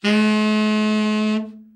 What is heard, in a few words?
tenor, tenor sax